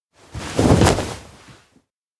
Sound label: wake hurry; rikka; anemoi